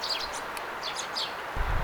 tuollaisia pikkuvarpusen aania

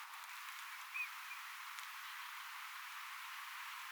tavin soidinääni erilainen ehkäpä hiukan

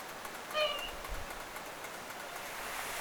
tuollainen laulujoutsenlinnun aani

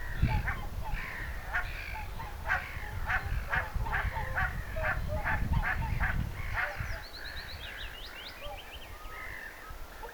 valkoposkihanhen huomioaanta

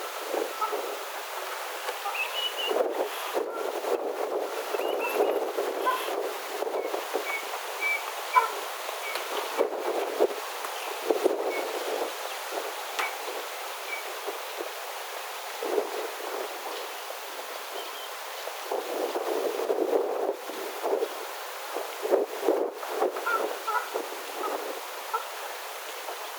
oranssihanhulipari saapuu rannalle hieman aannellen

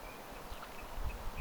mikä laji tuo pieni ääni

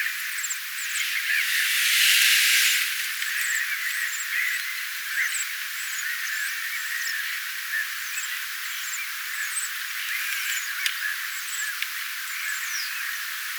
jotain visertelya mahd phylloscopuksen; pajulinnun visertelyä?

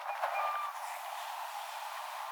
laulujoutsenen aani

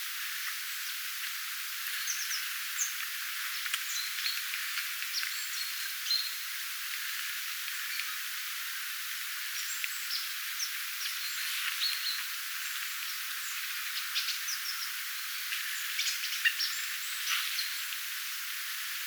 joku erikoinen homotiaislintu taustalla

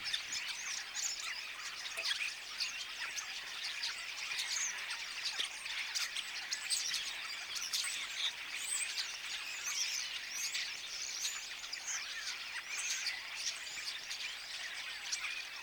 nuoret kottaraiset laulavat kuorossa

